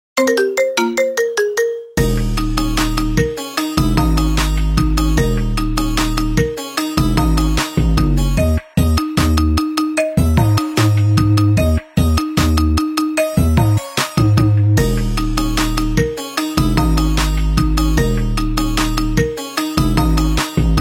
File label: marimba